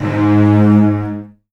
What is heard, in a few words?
Virtuoso Strings, TRM FX; ILIO; Kirk Hunter Virtuoso Strings VOL-2; 90 s Sample CDs; 10CL TRM FX